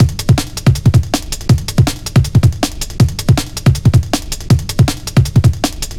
160, Drum Loops, Drum & Bass; Total Drum&Bass

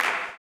CLAP, blues & soul; ILIO; Vocal Planet

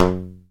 Tube Hi G Slap; Planet Earth; Digital Sound Factory Planet Earth

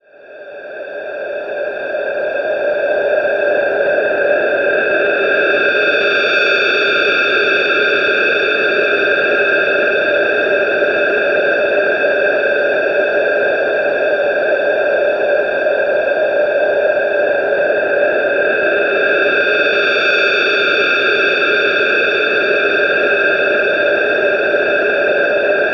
Ventilate, Ambient; Zero-G Seismic Frequencies; 90 s Sample CDs

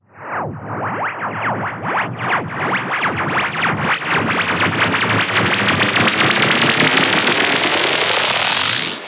Sweeps Up, Effects

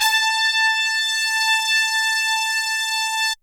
HORNS A4

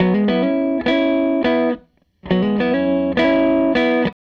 Gretsch Guitar Loop

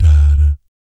BS DADAT, Vocal Planet Jazz & FX; ILIO; JAZZCOMBO

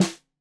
Snare 65 Reg Stereo, Deeply Sampled Drums

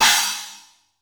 DRY CY SPLS, drum; Crypton - DrumBase5000; DRY KITS

